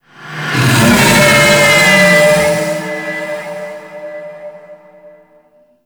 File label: Vocal Pain; Enemies; Vocals; Warframe; Teralyst